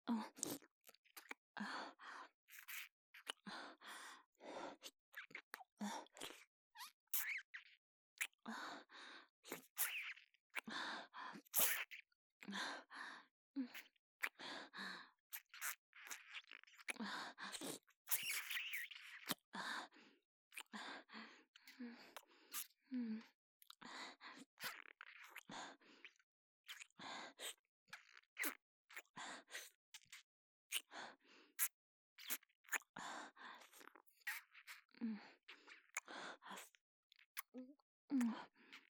キス

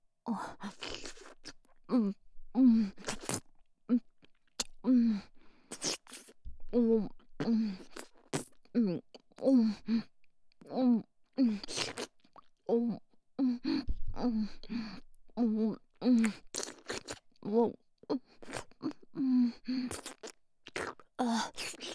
フェラ 激しめ

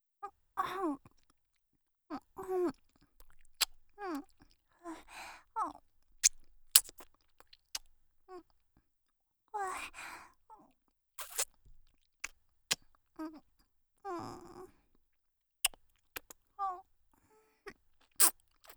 フェラ

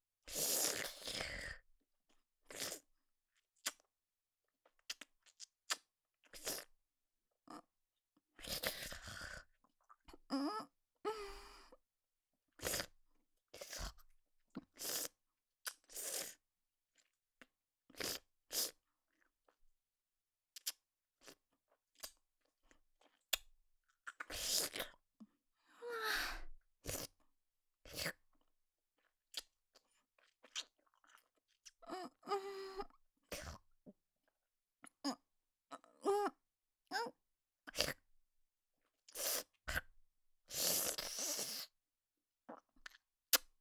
咥えて吸う音

